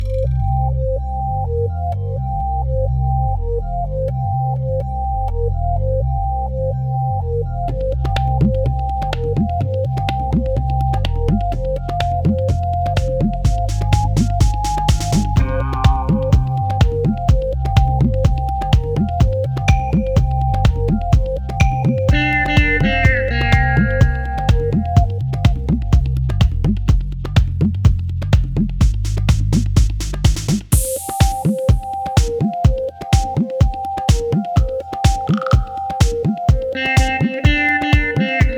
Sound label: festive